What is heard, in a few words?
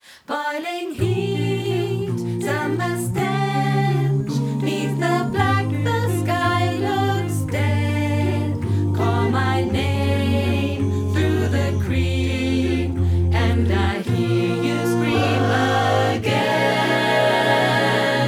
Remote Choir Verb Ambience In Context